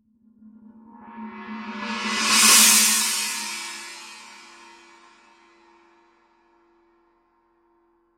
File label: 18 china roll, paiste; pst 7